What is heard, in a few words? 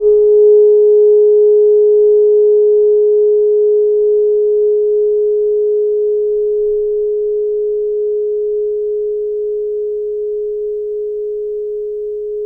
chime; New Moon